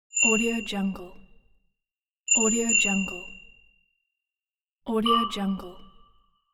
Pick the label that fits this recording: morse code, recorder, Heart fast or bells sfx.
bells sfx